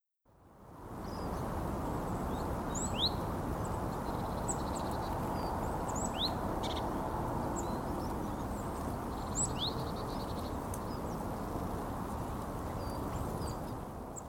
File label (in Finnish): talitiainen; linnut